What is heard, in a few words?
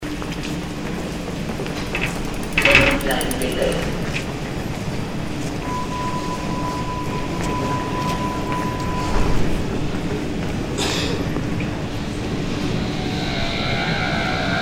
subway departure